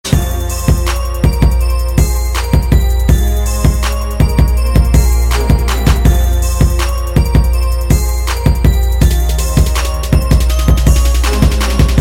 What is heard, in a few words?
Beat